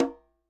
CH op m, KILLER CONGA

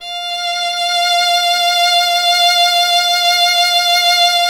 violin, Solo Instruments

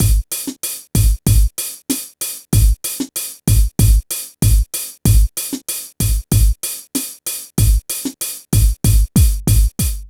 PHATT BEA, Hip Hop

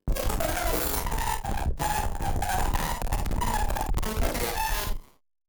Battered Synth; malfunctioning synth samples

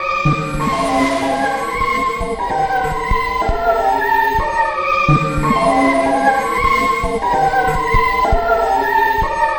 Overexcited Choir, Vocal; Sonic Foundry (Sony Creative Software); Myths of Technology

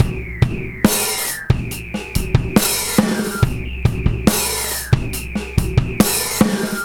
70 bpm, breaks; Rollin'; Zero-G Beneath the Planet of the Breaks

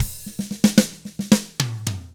POP ROCK, Double Platinum Drums; 109POP ROCK

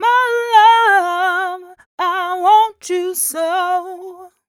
f 126 mel f-sharp, fem Vocals

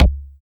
TEK KIK; TEK PERC1; Drums In Your FACE!

